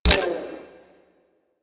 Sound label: Rifle Dry Fire; Warframe; Weapons; Wisp Rifle